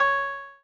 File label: Koto Db6